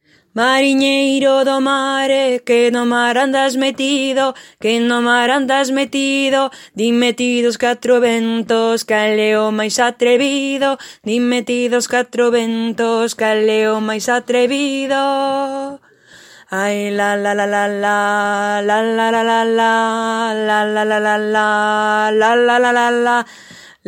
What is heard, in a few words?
Muinieira basse; Ateliers chant